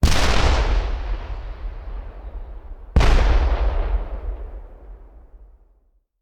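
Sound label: Artillery Rocket Launcher; Sound Ideas; Guns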